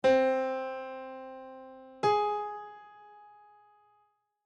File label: music intervals minor sixth